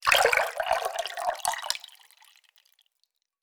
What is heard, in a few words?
Liquid Pouring; Survival Game Sounds - Lite Edition; Environment